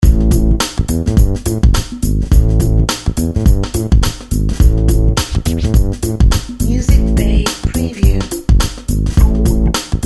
Positive Funky Beat

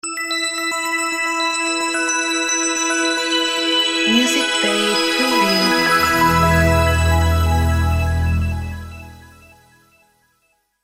Light Animation Logo

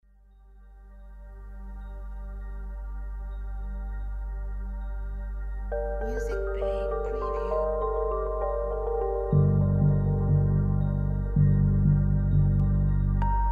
Carol Of The Bells Ambient

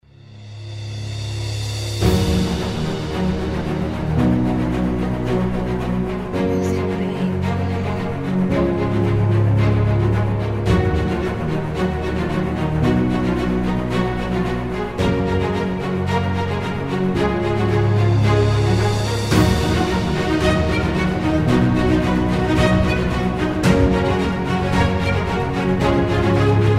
Epic Cinematic Opener

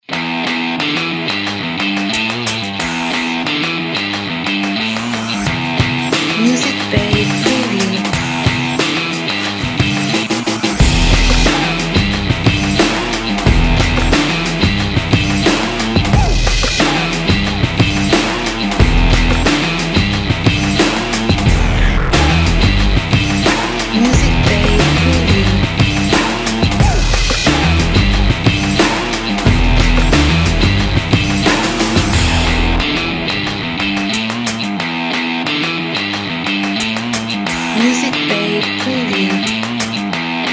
Action Advertising Background

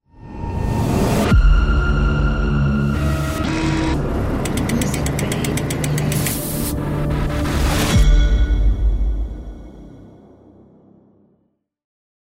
Transforming Mechanical Opener

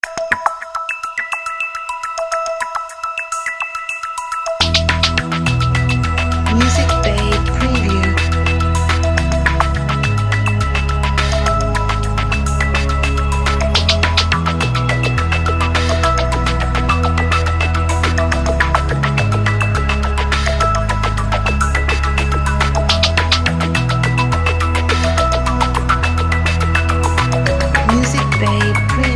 Hi Tech Minimal

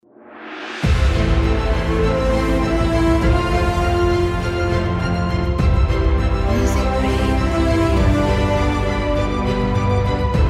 Epic Cinematic Trailer Intro

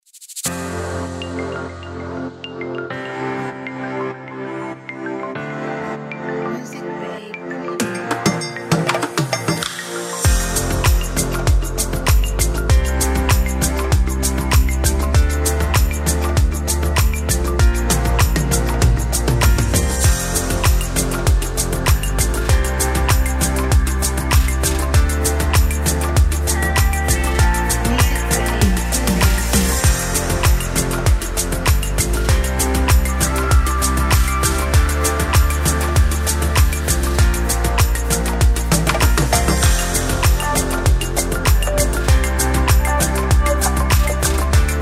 Inspiring Motivational Uplifting

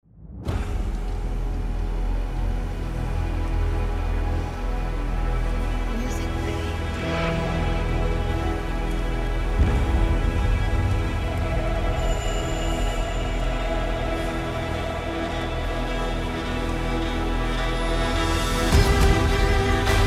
Scandinavian Epic Cinematic

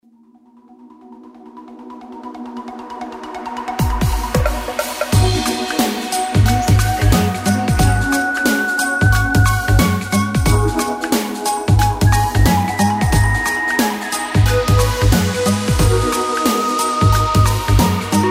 Aqua Logo

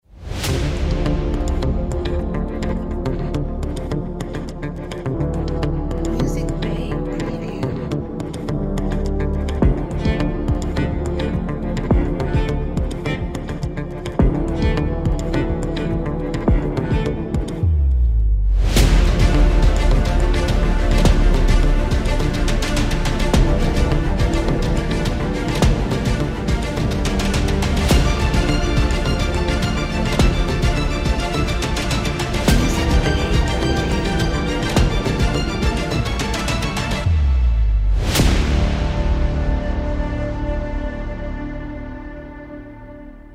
Epic Dramatic Cinematic Trailer

